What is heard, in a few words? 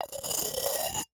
Jar, terracotta, ceramic, lid, rubbing, scraping, grating, rough, ragged, swoop; Eiravaein Works; Vaeyan IV